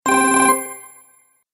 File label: Game Sparkle Sounds